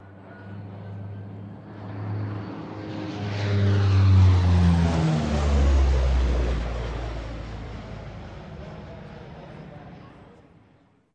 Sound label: Plane Turbo Prop; Airplane, Turbo Prop Aurora, Pass Overhead